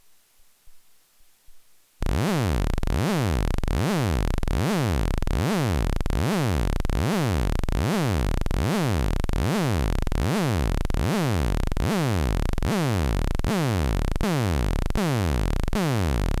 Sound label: Korg Monotron Delay LFO Tri SAW Anti Saw